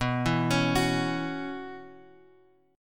B Suspended 2nd Suspended 4th, Bass Standard; arpeggiated; JGuitar

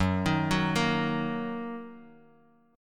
F sharp 6th F sharp down Guitar DADGAD

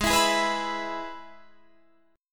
G sharp Minor Sharp 5th, Guitar, Standard; G#m#5 strummed; JGuitar

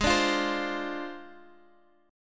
G sharp 6th Flat 5th, Guitar; strummed; 16,17,15,17,x,x; 4E,4A,5D,5G,5C,6F; G#6b5

